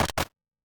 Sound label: Gun load; Cowboy; JotPK